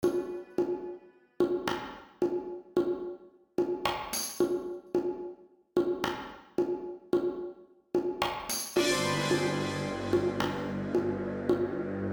Mystical Enigmatic Background Music; CleytonRX

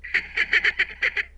some animal noise

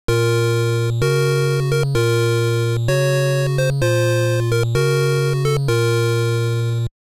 Funeral March

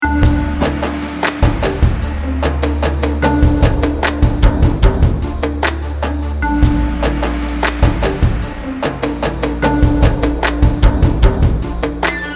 battle, aboriginal

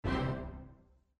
success

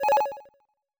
opening press

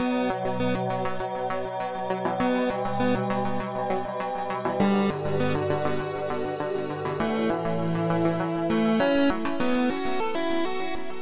exploratig the cosmos 8 bit

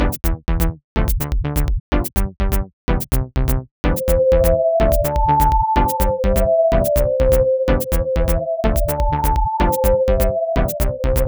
bot jazz; upbeat roboghost

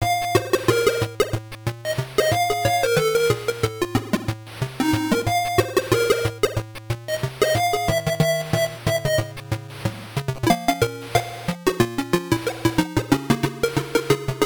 organ

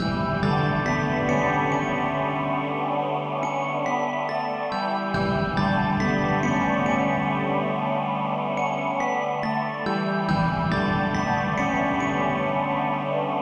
relaxing not looped

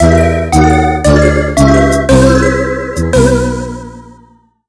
arranged lose, melodies

